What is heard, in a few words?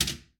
Pinball Bumper